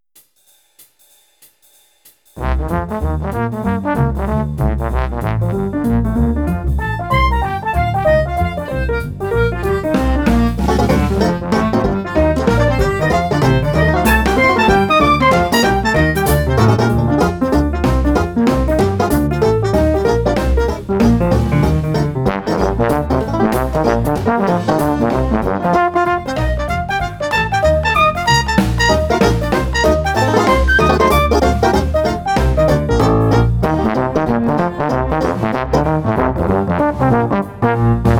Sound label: Just A Jazzy Jingle